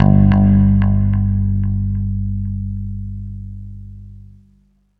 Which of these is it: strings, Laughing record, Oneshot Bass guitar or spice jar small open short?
Oneshot Bass guitar